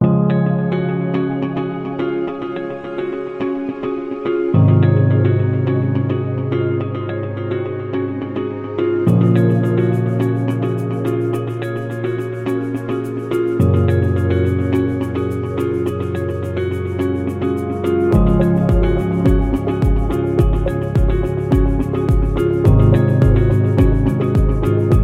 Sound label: Innovation Ambient, corporate music; corporate music vol 20